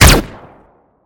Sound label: weapon; Esper